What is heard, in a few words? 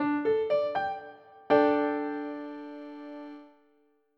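D sus4, guitar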